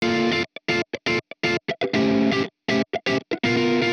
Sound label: amp wet guitar funk; Morphdrive; Demos for hybrid configurations